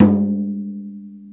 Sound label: MalaiyuDrm